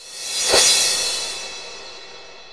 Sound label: reverse cymbal